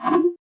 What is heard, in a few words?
menu back